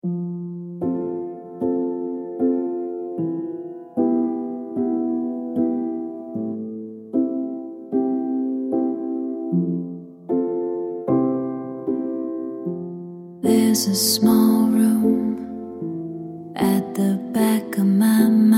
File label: residual vocal; the small room